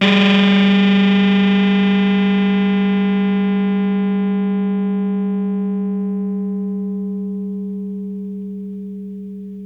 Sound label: REZ RHODES; PlatinumPhattCD2; E-MU Producer Series Vol. 8 – Platinum Phatt